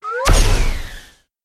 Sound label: Item Rocket; In Internal Item Rocket